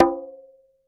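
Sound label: quinto open, drum machines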